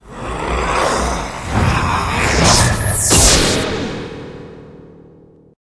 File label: elder lich attack, monster